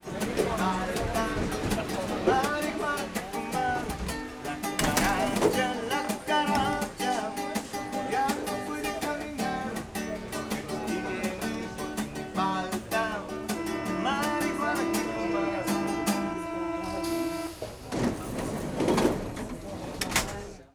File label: metro; olympus ls-10